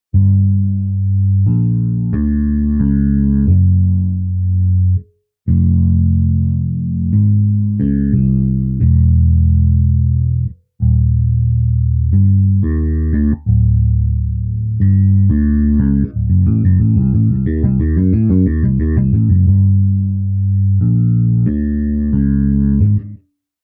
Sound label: Bass Practice; the legend of zelda majoras mask reborn 2023